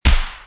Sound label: crash; subwayrunner